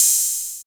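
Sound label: TR-808 open hi hat; Hi Hats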